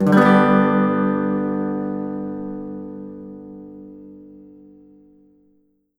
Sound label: guitar